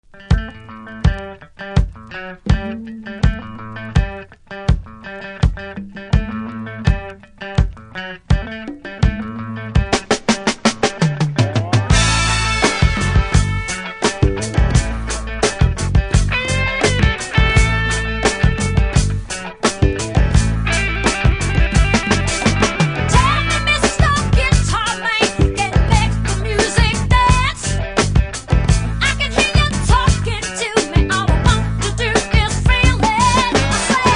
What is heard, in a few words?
guitar talk